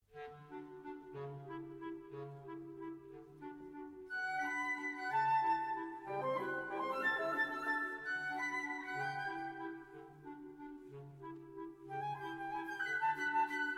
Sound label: Suite for Small Orch Valse